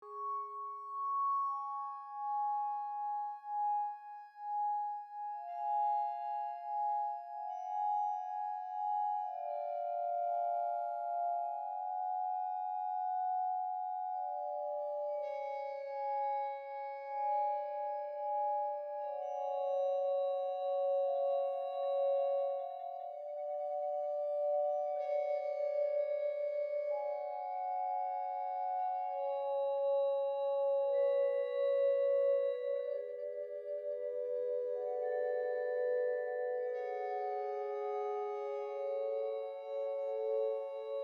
Exploration (Loop); The Walking Dead: The Telltale Series

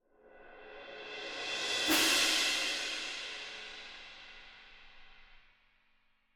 Transition Low High Action Drum Stop Cymbal Layer; gamerip

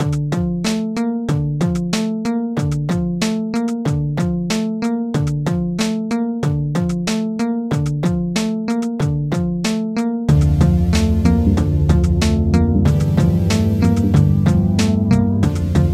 castle loop; Secrets of Arcadia

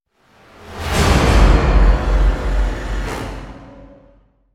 Stab

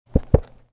open rotator cover

